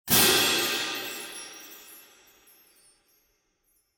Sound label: win Perc; fairymirror